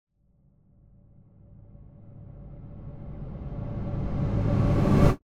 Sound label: Whoosh Reversed Air Approaching - Low; Hollywood Edge; sound designer's toolkit v2